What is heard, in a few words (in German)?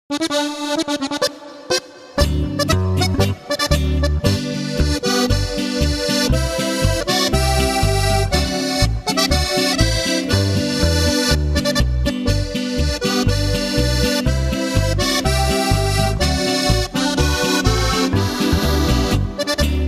wetterkreuz marsch